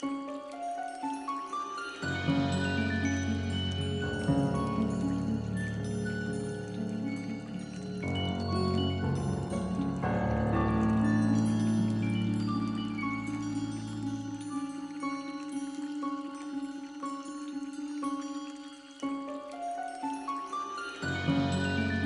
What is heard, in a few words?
Purification Chamber